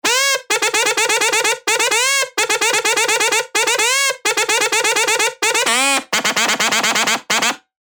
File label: Dirty Dutch Leads; Bizar Lekker; Revealed Spire Dirty Dutch Leads Vol. 1